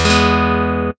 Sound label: chord E